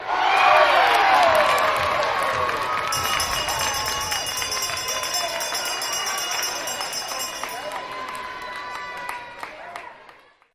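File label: Crowd Cheer Applause; medium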